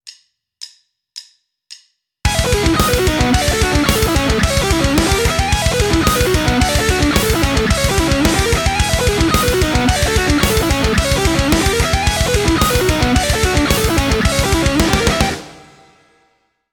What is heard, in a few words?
Rockschool, Guitar; Guitar Techniques 2019 Issue 297 July